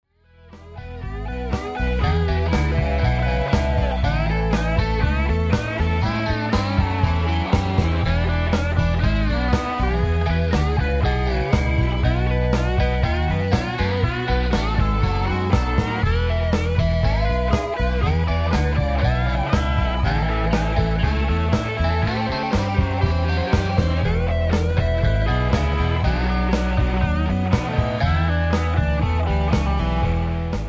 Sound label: lead break